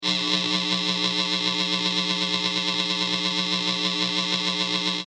Electric Buzz Warble, Oscillating, shifting electric buzzing; Oscillating, shifting electric buzzing pulses.; Electrical Sound Effects; Lynne Music